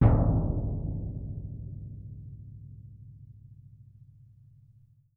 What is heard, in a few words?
BD2, Percussion